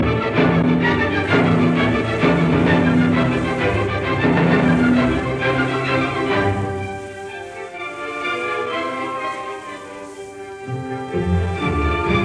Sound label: Symphony in D minor Choral Molto vivace; Op 125; Arturo Toscanini